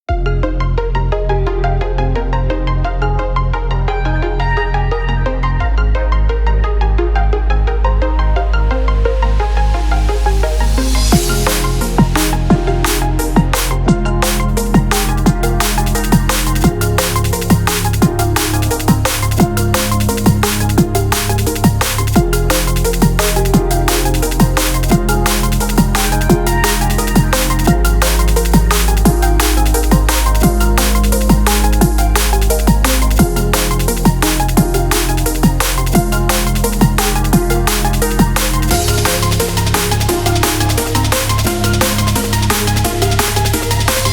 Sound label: Instrumental